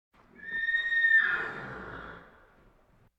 Animal effects snorts grunts farm ambience

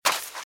Footsteps - Sand - Dry - Run - Right

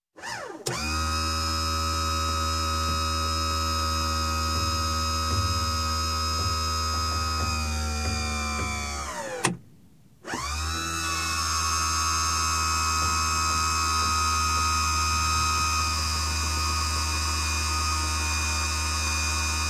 Boat Outboard - Adjusting Trim Unit Up And Down; Marine; Sound Ideas; Series 2000

